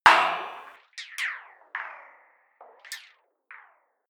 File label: metal hit crazy